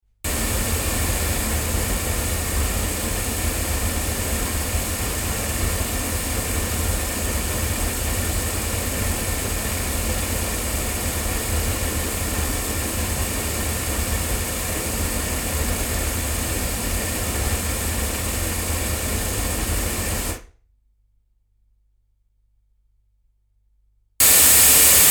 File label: omni loudspeakers; microphones; studio projects; SP LSD2